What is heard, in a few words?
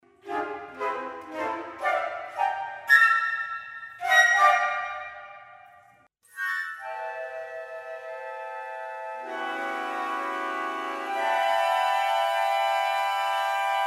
woodwind ensemble flute effects